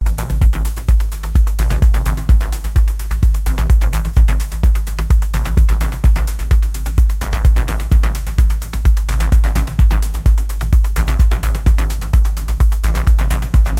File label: electric heartbeat